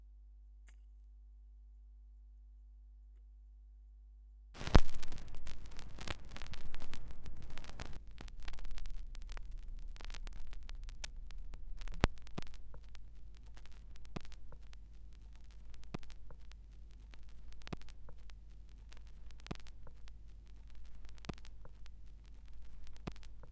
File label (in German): schallplattengeraeusche, Interview Picture Disc